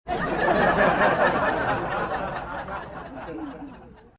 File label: small room laugh track